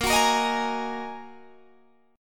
A sharp 7th Suspended 4th Sharp 5th down Guitar Standard; strummed; JGuitar